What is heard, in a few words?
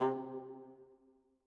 bassoon stac C3, Woodwinds; BBC